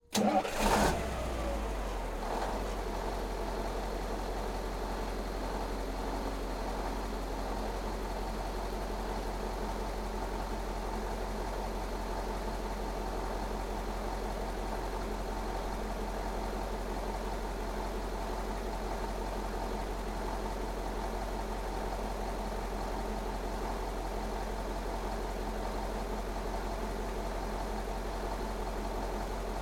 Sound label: Truck Ural Spot A Start Idle Stop Front Perspective, URAL 4320 Diesel; FLYSOUND